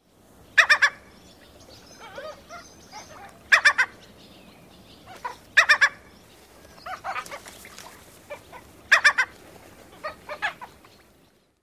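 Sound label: Laughing Gull ga-ga-ga alarm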